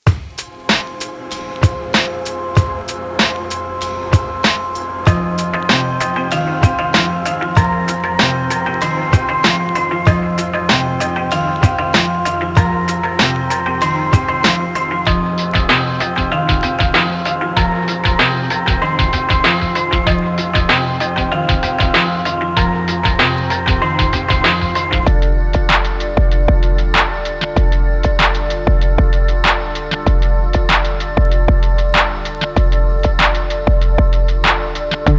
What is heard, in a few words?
organic lofi drums